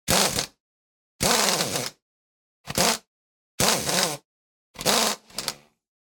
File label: Pneumatic Tool Drill Muffled Short Bursts; noise creations